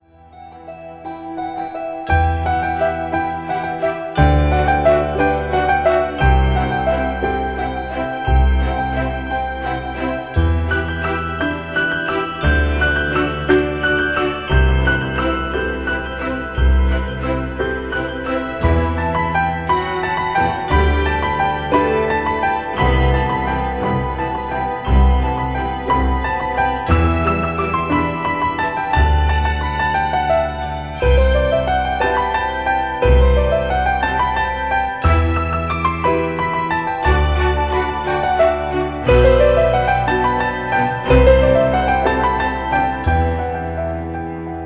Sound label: instrumental ringtone